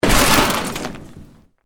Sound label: Explosion Short Metal Glass; house, building or vehicle effects; Bombs; Created explosion effects